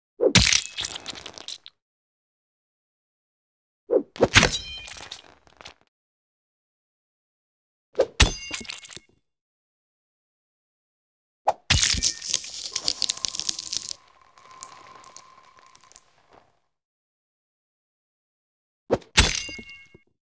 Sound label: Beheading With Axe; 5 Versions; Sound Ideas; Series 2000